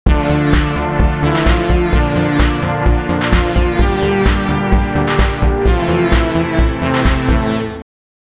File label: bass test song